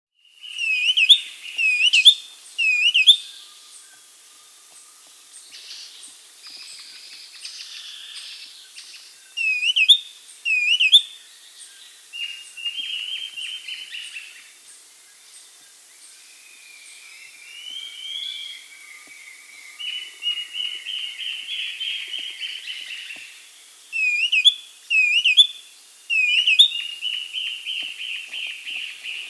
Black-capped Becard (Pachyramphus marginatus), Peru; S. America